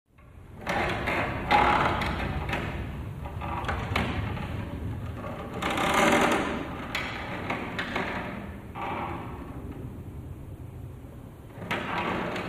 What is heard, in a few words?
Wood Seat Creaking; seats & stools